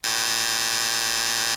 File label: time up